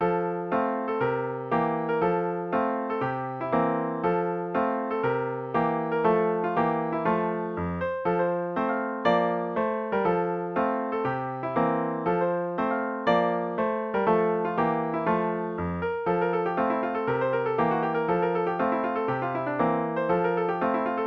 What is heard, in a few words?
keel row piano